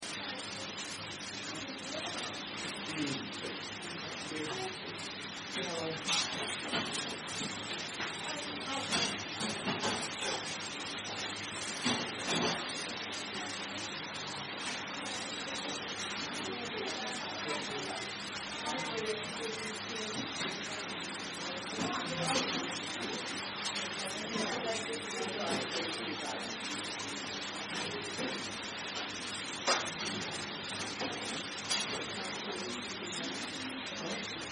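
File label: Ambience Fish Chips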